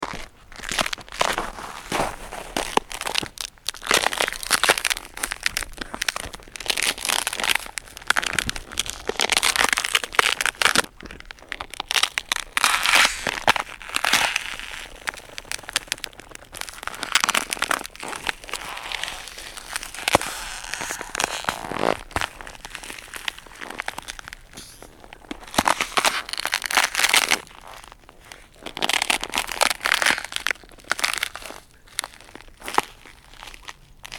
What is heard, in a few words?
Ice recording from the shetland islands; Ice recording from the shetland islands, dec 2004.